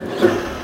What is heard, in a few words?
chasis start; War Thunder